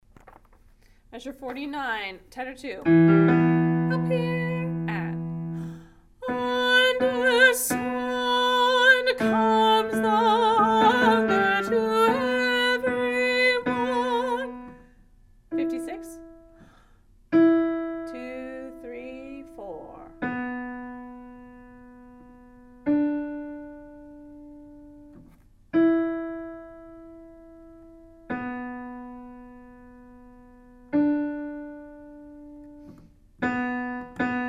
tenor

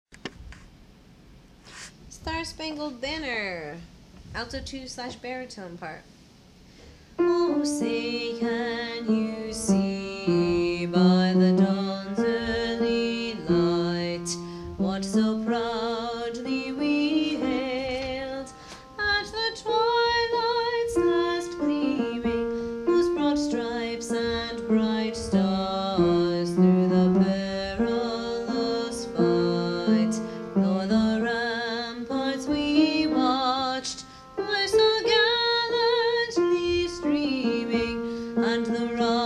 alto

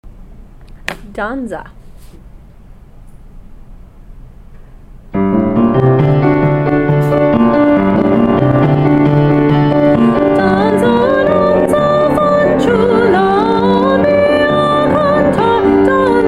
Danza Alto

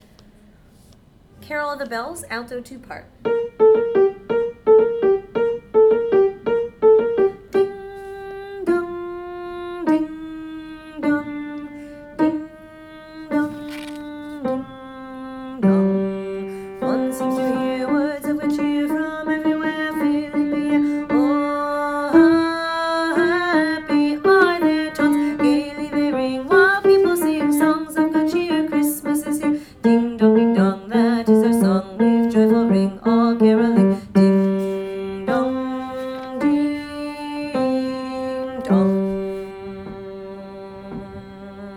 Carol of the Bells A

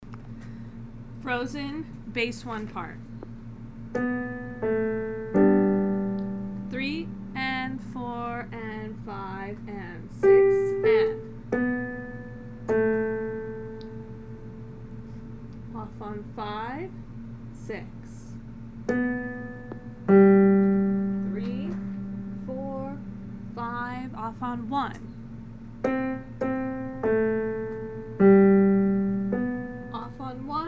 Bass 1; Frozen

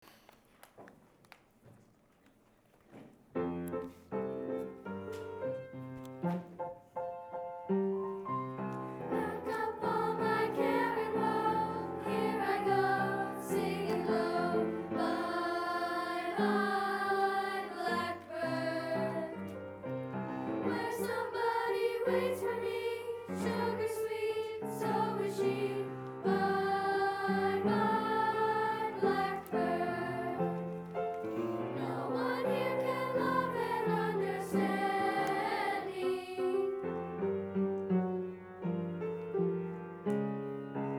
soprano

